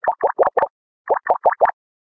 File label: Grunting, notifications; Mi Mix 2S Stock Ringtones